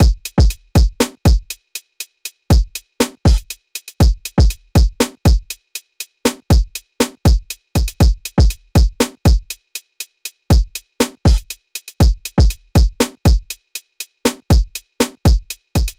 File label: Summer Guitar